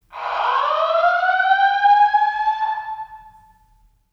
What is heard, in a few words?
Siren